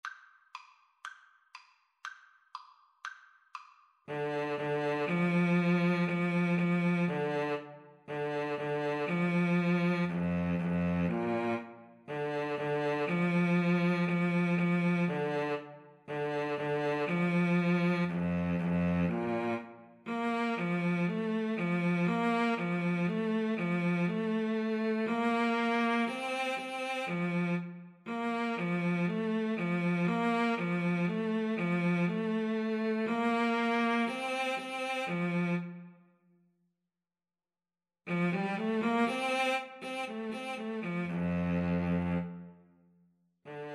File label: Clarinet Cello Duet